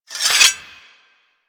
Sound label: Axe Equip; Warframe; Weapons